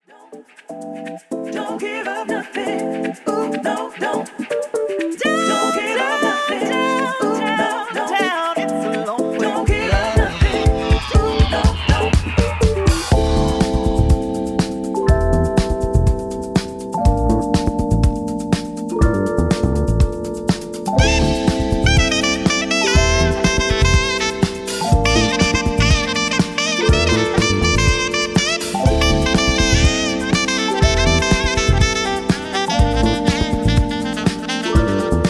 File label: Bassman Funky